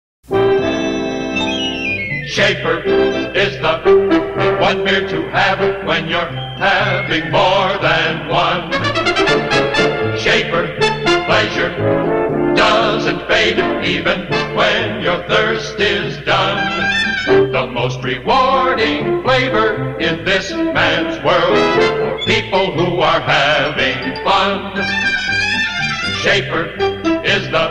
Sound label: Beer jingle; Schaefer